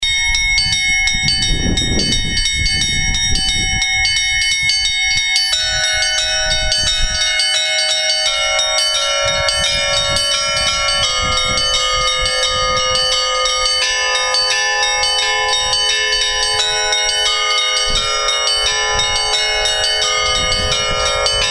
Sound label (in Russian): звон